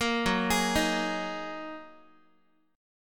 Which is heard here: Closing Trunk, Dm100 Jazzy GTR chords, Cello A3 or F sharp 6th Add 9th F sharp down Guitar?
F sharp 6th Add 9th F sharp down Guitar